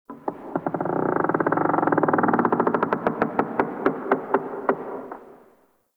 Ancestor Tree Wood Creak; Ambience; Soul Plane

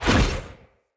Wolf Claw Melee Swing; Orengall

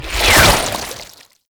Ink Rifle Alt Whizz By, Weapons; Warframe; Tenno

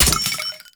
Grenade Launcher Shell Casing; Warframe; Scaldra Grenade Launcher

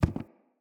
Walk Wood, Footsteps; Characters; Enemy; Mockeries; Mockery

